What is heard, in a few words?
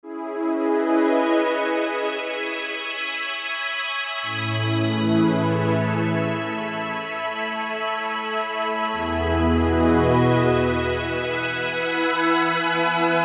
Overtone Scan